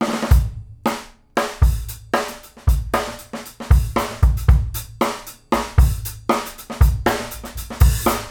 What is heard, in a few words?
groove, Grooves 99-132bpm